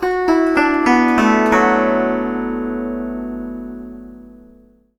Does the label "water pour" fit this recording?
No